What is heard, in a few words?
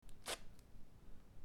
rip off